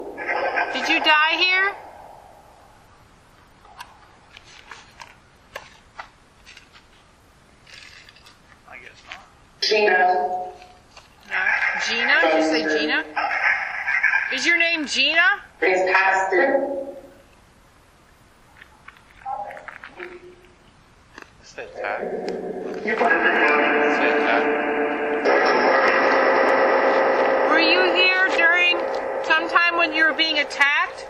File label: Open Field Phasma; martinsburg roundhouse investigation